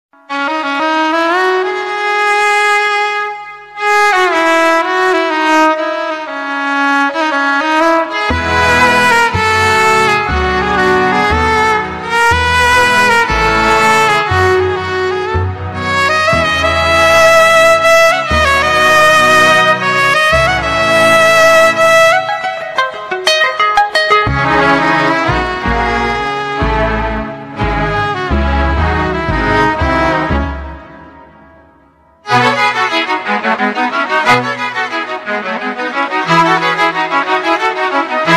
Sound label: theme music violin